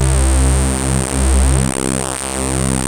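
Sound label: RAVE synth C3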